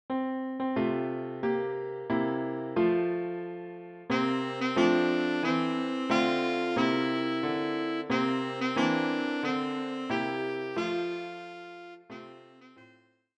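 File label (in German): Altsaxophon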